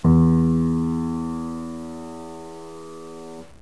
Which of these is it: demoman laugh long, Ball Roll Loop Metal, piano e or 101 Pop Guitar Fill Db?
piano e